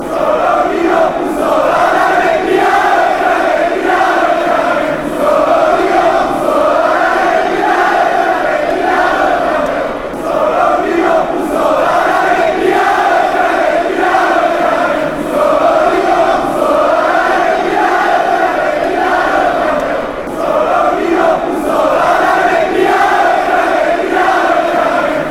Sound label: only genova, fanchants